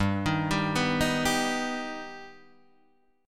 G Augmented G Guitar Standard; G+ arpeggiated; 3,6,5,4,4,3; JGuitar

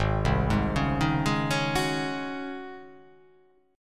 G sharp 11th G sharp, down; arpeggiated; JGuitar

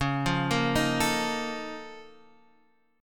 Bb Minor Major 11th Db

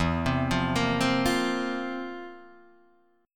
C 11th E

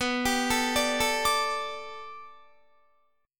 B-Minor 7th Sharp 5th B down Guitar; arpeggiated; 4E,4A,5D,5G,5C,6F

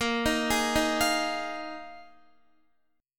A sharp 7th Suspended 4th A sharp, Guitar; A#7sus4; arpeggiated; x,13,15,13,15,13

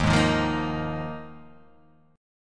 Eb Suspended 2nd Suspended 4th, chord; Ebsus2sus4 strummed; JGuitar

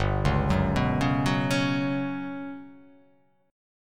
G Major 7th Flat 5th

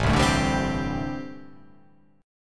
A Minor 11th A, Chord

